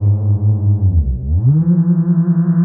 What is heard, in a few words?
pitched, Feedback; 99Sounds; Dark Engine